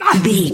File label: voice male hurt pain